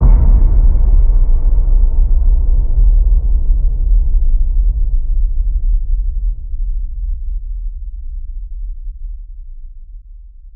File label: Hit Low Guilt